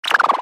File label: Wobbly Motion Design Downer